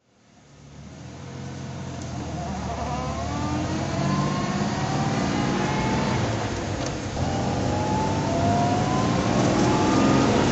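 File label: jaguar xk150 fhc drive, engine sound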